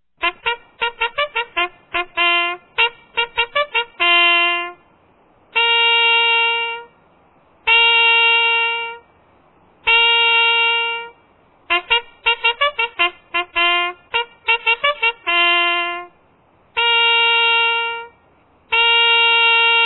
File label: bugles, general; dod